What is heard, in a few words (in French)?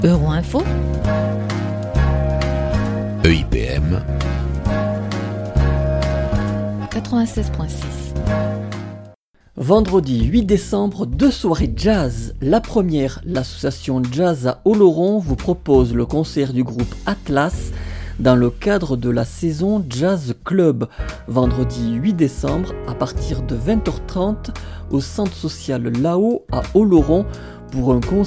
concert jazz; Tonnerre de Jazz et Jazz Club Oloron vendredi 8 décembre 2023